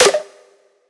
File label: clap snare